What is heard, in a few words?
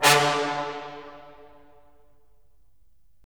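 brass; LA Composer vol. 3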